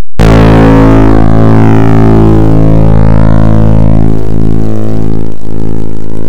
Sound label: guitar a1 hard clipped